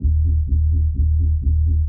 bass

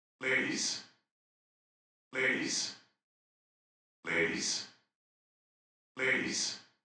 voc ladyz

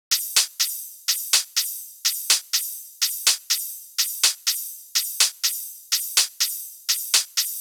beat break

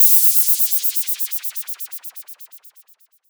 LFO NOISE; drums pads; noises

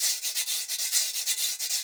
Guira 130

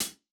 Closed HH; maschine

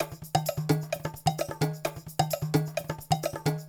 Loop Percussion 130

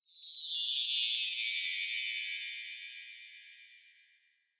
Percussive FX

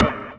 PERCUSSION

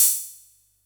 Splash

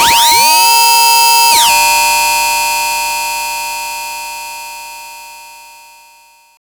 NOISE FX

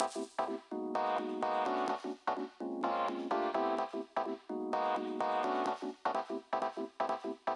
rhodes sequence

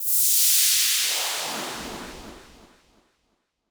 Sweep White Noise Fall

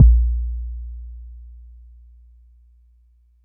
Kick Edged; maschine

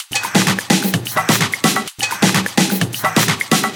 Percussive Loops

